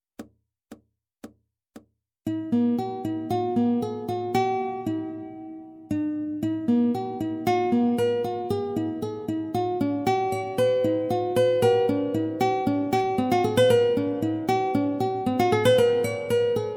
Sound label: allegro minus guitar 2; pescetti